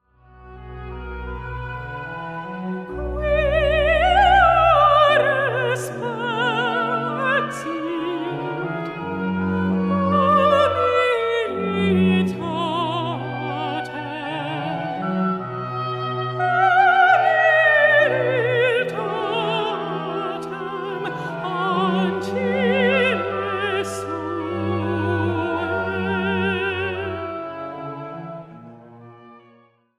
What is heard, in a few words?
Magnificat In D Major; BWV 243, No.3, Quia Respexit; sacred classics